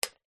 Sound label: industrial screw or nail small set down on metal work bench